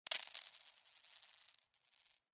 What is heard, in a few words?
Coins Tossed Counter